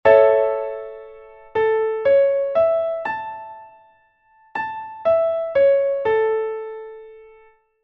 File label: major triad; Essentials of Music Theory: Elementary